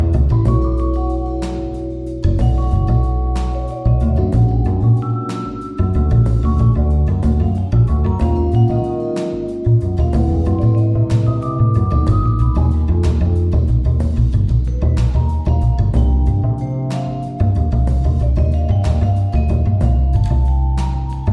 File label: Bass Jazz; Kevin Macleod; hiberworld android ios online windows gamerip 2019